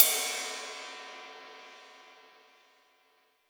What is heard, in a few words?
Ride C, Boss DR660